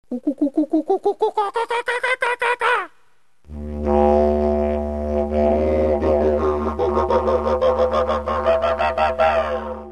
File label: kucabura, didgeridoo, Kookaburra Bird… Coo-coo-coo-Kaa-kaa-kaa-kaa; Timeless Productions; didgeridoo tutorial